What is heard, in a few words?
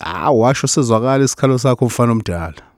Vocal Chirp, African Voices